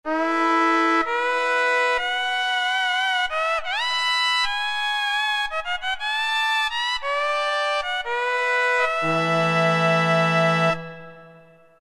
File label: Sho, Roland MT-32